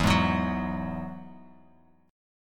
E Major Add 9th, E, Bass Standard; strummed; JGuitar

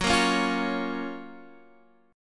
Gb Major 7th, down, Guitar, Standard; GbM7 strummed; JGuitar

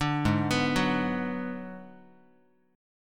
G sharp Minor 7th Flat 5th G sharp down Guitar; G#m7b5 arpeggiated; 5,4,2,4,x,x; JGuitar

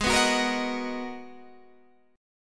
G sharp Minor 9th G sharp, Guitar Standard; G#m9 strummed; JGuitar

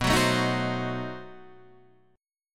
B 7th Flat 9th B Guitar Half Step Down

